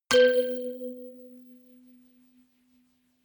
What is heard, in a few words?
notification kalimba rattle octave low